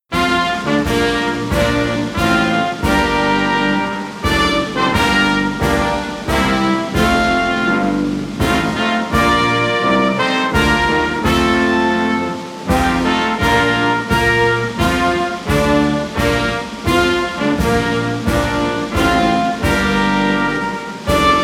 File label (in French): hymne national the star spangled banner version instrumentale